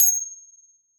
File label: grab gem; pirates